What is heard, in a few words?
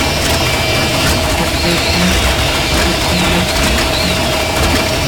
engine high